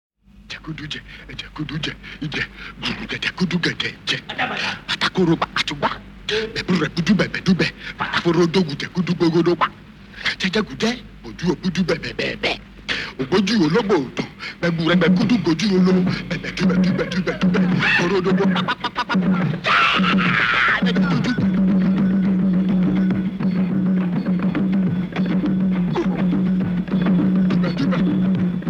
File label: Cabaret Music